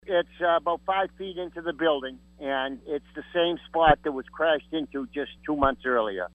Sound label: brass